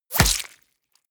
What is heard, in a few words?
bullet small impact body